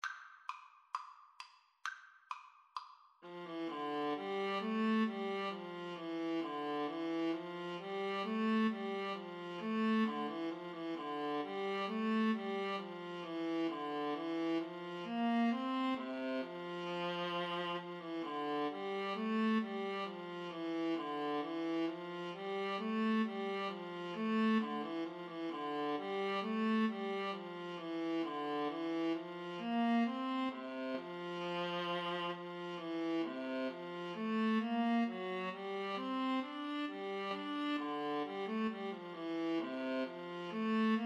bourree, Violin Viola Duet; bach

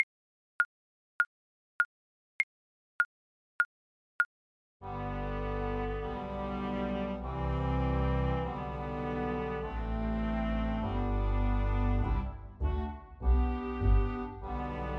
twinkle twinkle, oboe

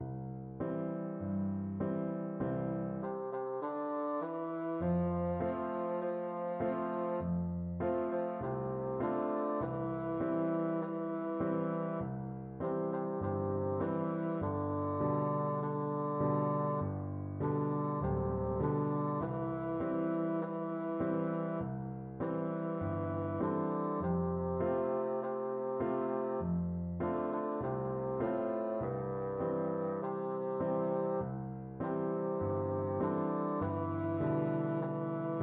bassoon

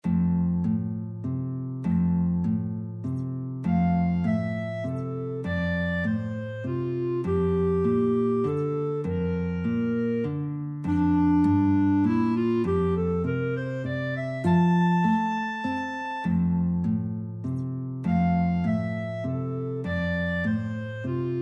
guitar clarinet duet

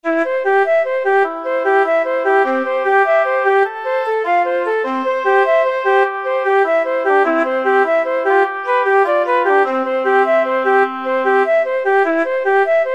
flute oboe duet

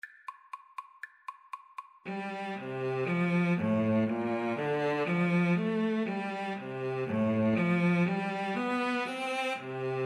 trumpet cello, Trumpet-Cello Duet; offenbach can can

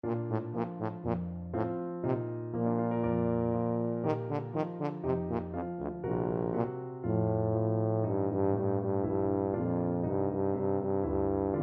reed pipes, tuba

